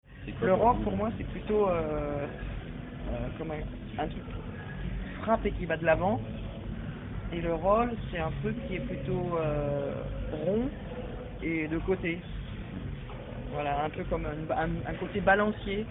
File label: rock n roll ringer